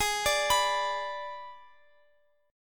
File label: Ab Diminished Ab Guitar Open F; arpeggiated; x,x,x,15,17,18